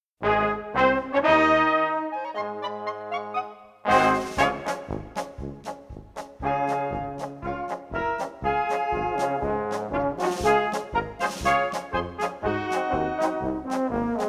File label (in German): Goldene Trompeten